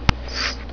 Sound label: Dart